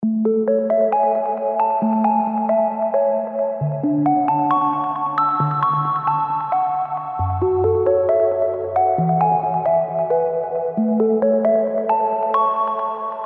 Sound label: Electric Happiness, keys; revealed diva keys vol 3